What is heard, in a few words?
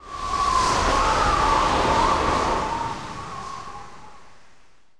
Blizzard